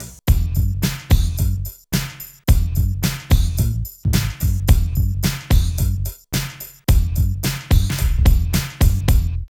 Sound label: LOOP, Phat & Phunky; East West - Phat & Phunky CD2; 90's Sample CDs